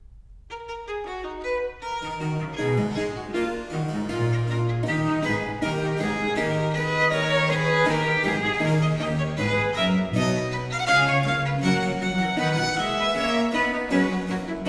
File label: Ayres for the Violin, Suite in A Major, Fuga - Allegro; Book 4; Italian Baroque Violin Sonatas; Nicola Matteis; Ayres for the Violin, Vol.1; 尼古拉斯 · 麦克吉根